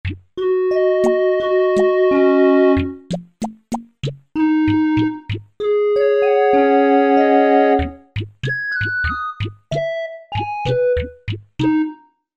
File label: Toys Music; Korg MSC-1S Variety